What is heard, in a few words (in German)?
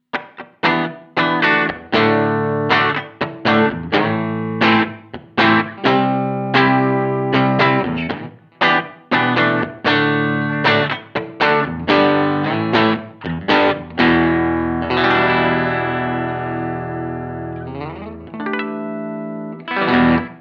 two notes le crunch kanal a strat steg pu analoge speaker sim